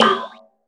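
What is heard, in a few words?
snare drum; Zero-G; Chemical Beats; SNARE ZOO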